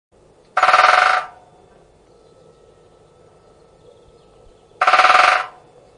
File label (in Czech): dendrocopos major bubnuje na plech